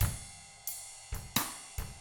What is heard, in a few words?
Double Platinum Drums, COUNTY